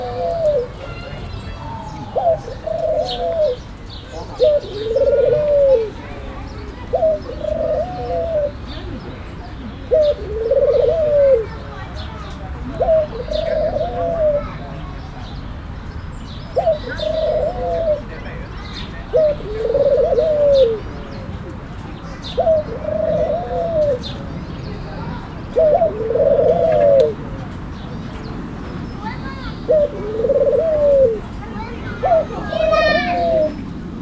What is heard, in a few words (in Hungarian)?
egyszer kacagis erős környezeti zajterhelés, kacagó gerle; kecskemeti zoo 2016